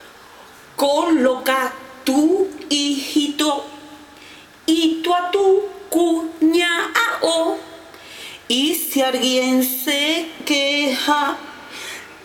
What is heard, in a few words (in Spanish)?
himno